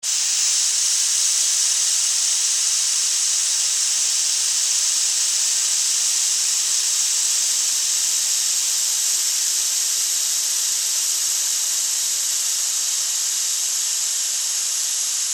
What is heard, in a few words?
Pesticide Fogger, Extermination, Insect, Bug; BlastWave